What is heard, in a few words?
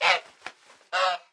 Goose Idle; Farm Mania